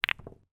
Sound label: sport pool snooker balls strike together light bounce of table wall